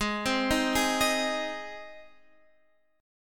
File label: G sharp Major, Guitar Standard; arpeggiated; capo 2; 16,15,13,13,16,x